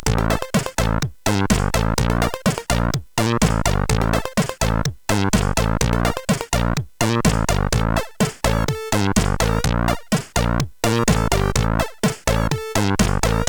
Shaban, sid; CSG8580R5; hvsc

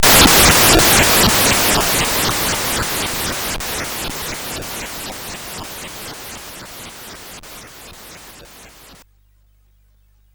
Frantic, CSG8580R5

